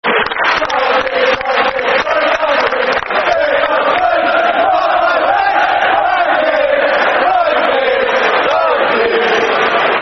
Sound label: Football Chant (Soccer Song), Man United; Ryan Giggs Running